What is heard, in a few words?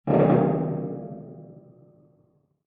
metal creak; Dystopia; injection